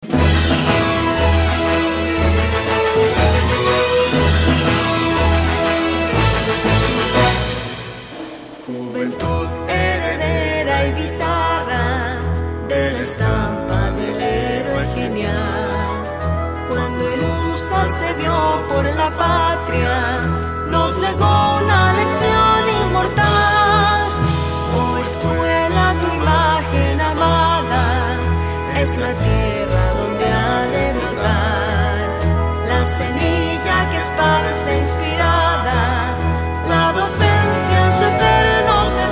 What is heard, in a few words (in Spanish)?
himno